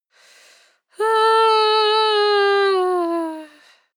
female moan whine soft; koerpergeraeusche; menschen; soundpool

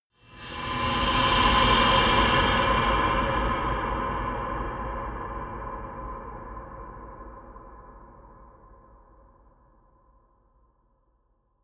metal bright reverb; maschinen; soundpool

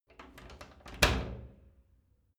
wood door old unlock hard

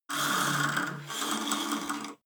cable machine old pull release fast; sport freizeit

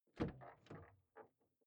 car door open exterior hummer h3

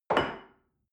shell upside down place on table soft short; berufe; gastronomie; soundpool

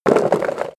horse hooves clack stop; natur-tiere; pferde

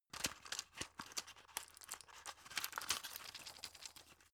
drug pull out shaky; berufe; arzt

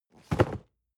cardboard box medium fall low; objekte

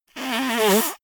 nose blow; comedy cartoon; koerpergeraeusche

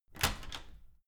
open door handle

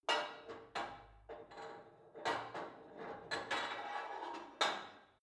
put weight onto barbell unsteady; sport freizeit; fitnessstudio

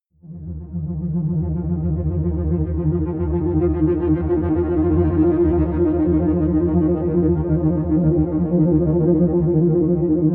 synth trill, drones; musikelemente

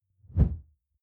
whoosh simple short low; swooshes-swishes

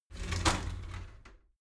shower slide door inside open regular halfway

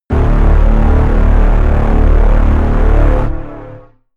synth trailer hit, hits impacts; whooshes rises

